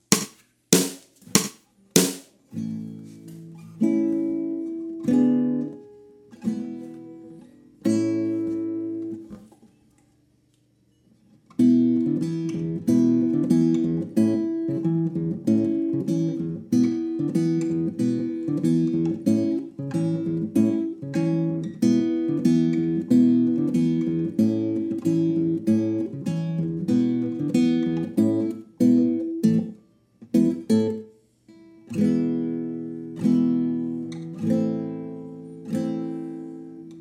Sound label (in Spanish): Una guitarra